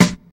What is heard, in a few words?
dope snare sample f key; snare drum samples